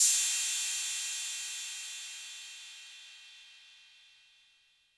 Cym B 808 Tape Decay C; Cymbal; Clean

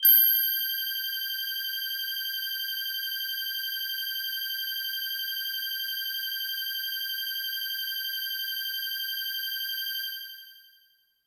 Bell Air Kawaii G-1

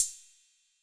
Cymbal 808 Color

